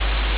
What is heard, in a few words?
ambience room tone